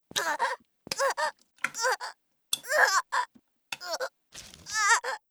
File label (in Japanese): "あ゛っ"; 効果音あり